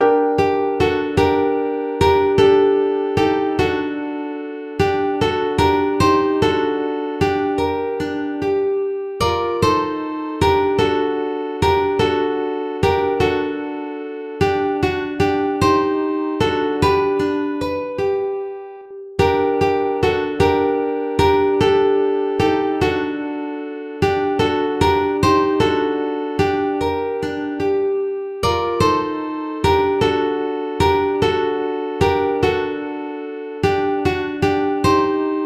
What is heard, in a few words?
Coventry Carol Uke